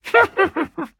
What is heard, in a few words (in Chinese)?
女巫环境声